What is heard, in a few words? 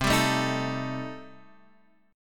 C Minor 6th Guitar Open F, chord; Cm6 strummed; JGuitar